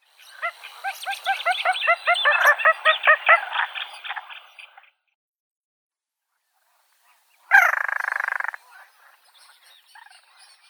pogonish malii porzana parva; zvuki prirodi

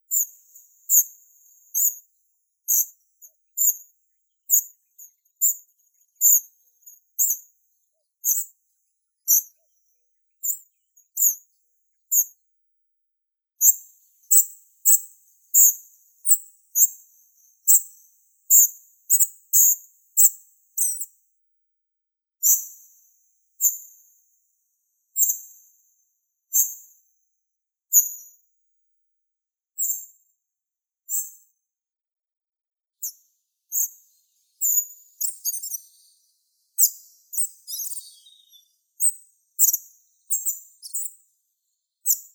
muholovka seraya muscicapa striata

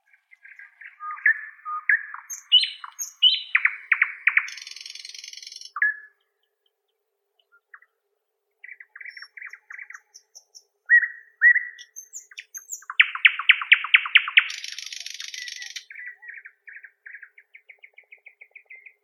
solovei obiknovennii luscinia luscinia, zvuki prirodi

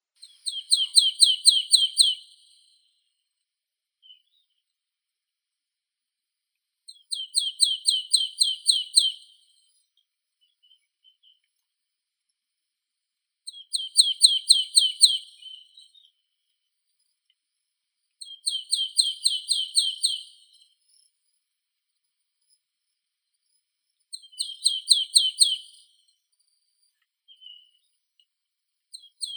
gaichka chernogolovaya poecile palustris

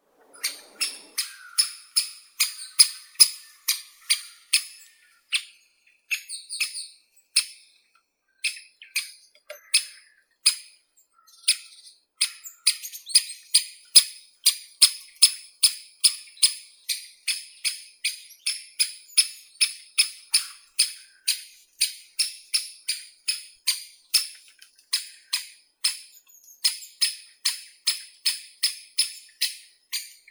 dyatel bolshoi pestrii dendrocopos major

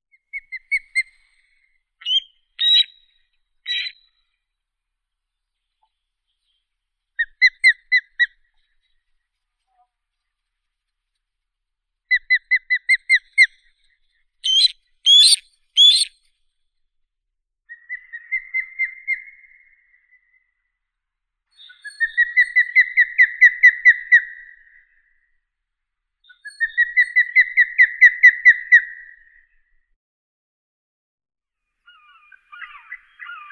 orlan belohvost haliaeetus albicilla

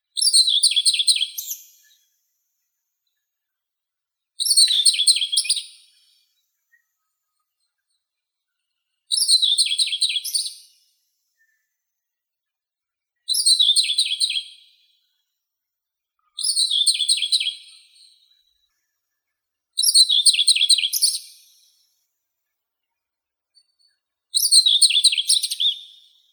slavka seraya sylvia communis, zvuki prirodi

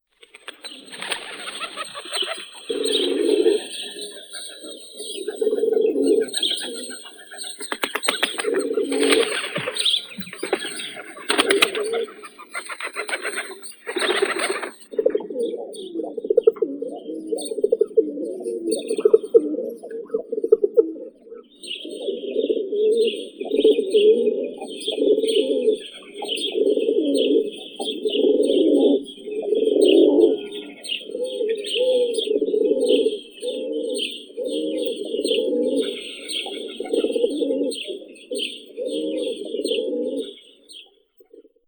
golub sizii columba livia

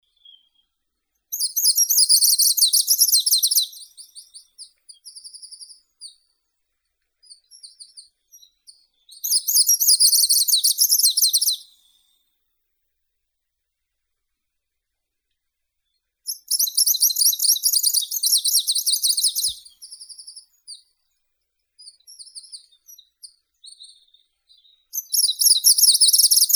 penochka zelenaya phylloscopus trochiloides

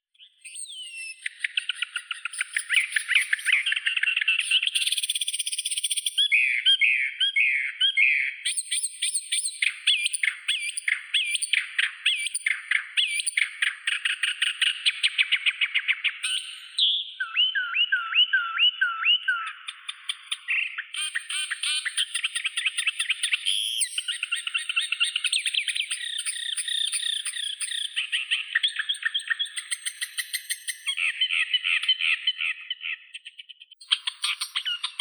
kamishovka tolstokluvaya acrocephalus aedon

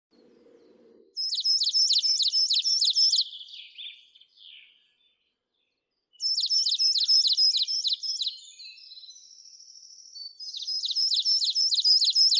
moskovka periparus ater

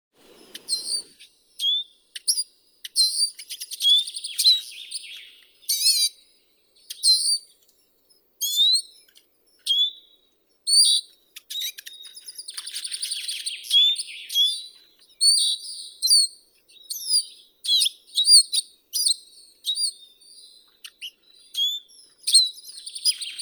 chizh spinus spinus

